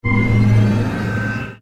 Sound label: powerup